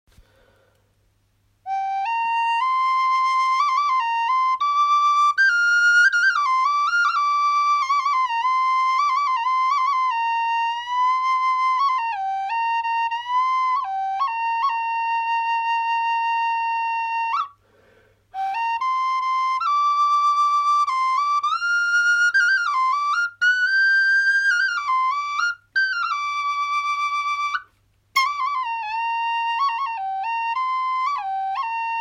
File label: Gm Forest Flute